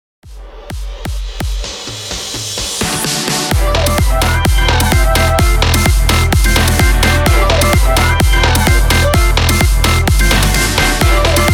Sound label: CARIBBEAN RAVE MASHUP 128 BPM